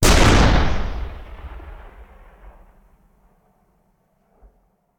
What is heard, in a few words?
Cannon Tank; Sound Ideas; Guns